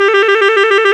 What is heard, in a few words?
WND TRILL, Clarinet FX; Roland LCDP04 Orchestral Winds; 90 s Sample CDs; Clarinets